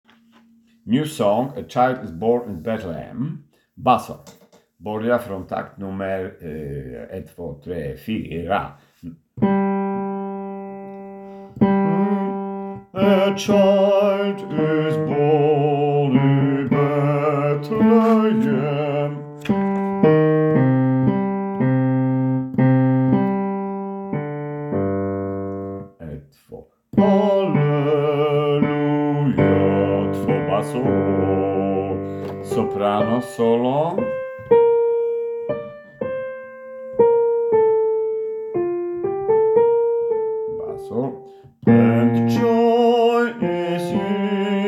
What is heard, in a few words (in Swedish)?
bas